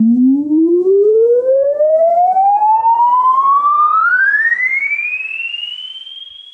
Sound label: Uplifter